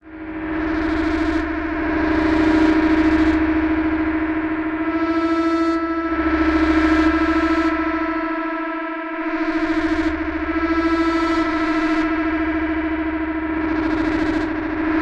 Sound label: forcefield